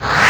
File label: REVERSED EFX